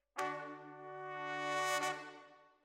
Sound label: Sax